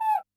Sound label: OOH, Yamaha DD-65